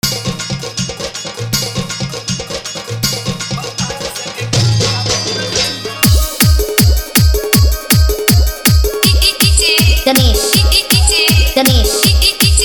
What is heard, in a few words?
New Bhojpuri Dj Remix Song